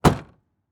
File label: Transportation Car Monte Carlo Exterior Trunk Close